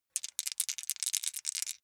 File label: small three rattle in hand, wuerfel